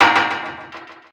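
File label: Handegg damage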